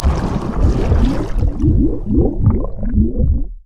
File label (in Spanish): agua